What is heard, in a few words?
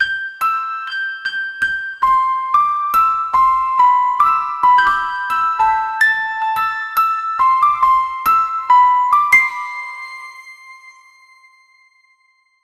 positive simple melody, elevator